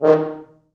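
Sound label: French Horns staccato; 4 FrHorns; East West; Quantum Leap Horns Sax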